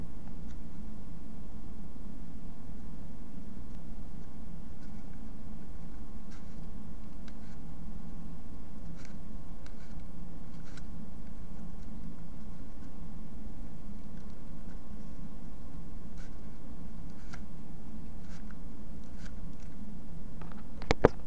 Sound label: cardboard iris